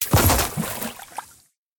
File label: water fall; Golden Crayon Sounds